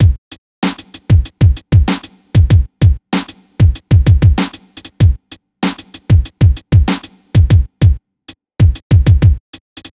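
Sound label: Drums, Hip Hop Exotica, 096 A minor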